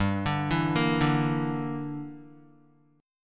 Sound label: G Minor 6th, Guitar; Listen to Gm6 arpeggiated